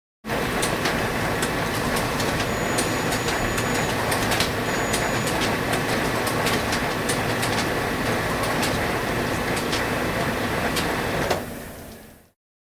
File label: Photo-Copier; 100 sound effects